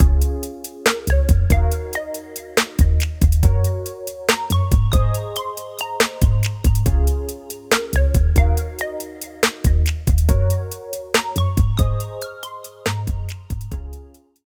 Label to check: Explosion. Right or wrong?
Wrong